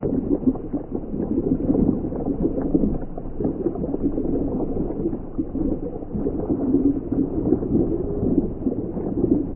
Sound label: scuba calm; ambience